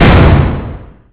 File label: shotgun